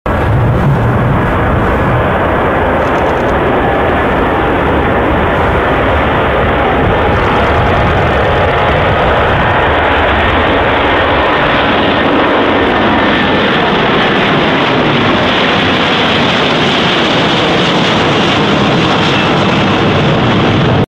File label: Airbus Beluga